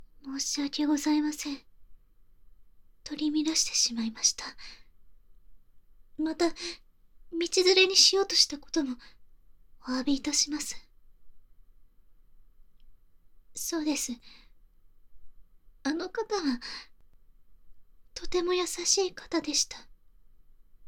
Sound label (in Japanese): 昇天癒し耳かき